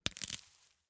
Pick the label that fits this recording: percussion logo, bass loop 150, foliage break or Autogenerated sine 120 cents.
foliage break